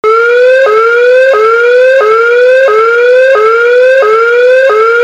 INTERMITENTE, sirene; sirene orbe

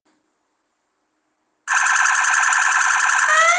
abre transito, sirene; 27C10 NOBREAK; sirene alta potencia incendio

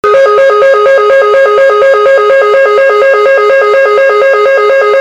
INTERMITENTE, sirene; sirene orbe

